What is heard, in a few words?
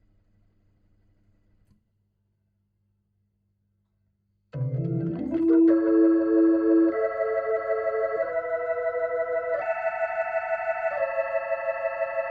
Hammond Leslie